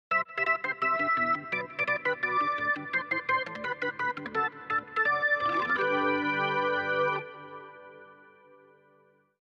organ

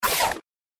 SFX Interface Change; Infernal Radiation; macos

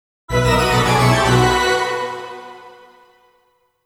Player Miss; Castlevania

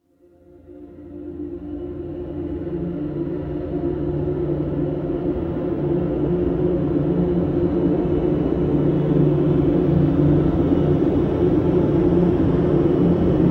Card Menu Ambience; Sea Salt

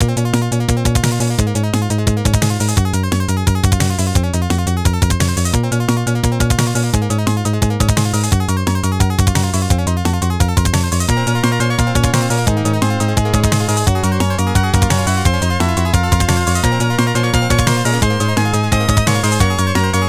Battle; Conquering the World III; Genesis

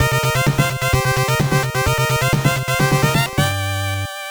Mono Stage Clear; final mission 1990 nes gamerip

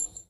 Shell Bounce

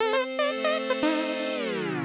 Pirate Stinger; Treasure Adventure Game

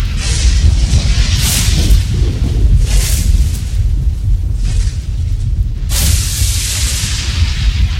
Loop Lightning

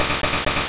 Super Fang

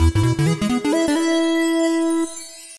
Victory Intro

